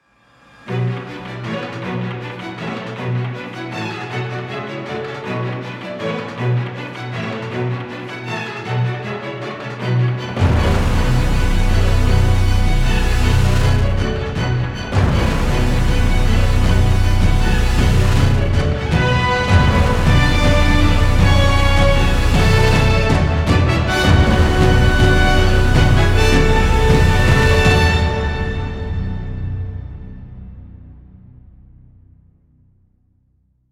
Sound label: Warning; Dragon Ball Super Super Hero